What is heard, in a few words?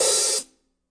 classic hip hop Oh, Classic Hip Hop; STYLE KITS (Hip Hop, Etc); Classic Hip Hop Mega Kit